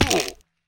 fall big classic, damage; minecraft; faithless

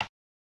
click, interface sounds; p0ss

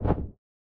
Unequip, retro rpg menu sounds; leohpaz

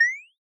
Pause, retro rpg menu sounds; leohpaz

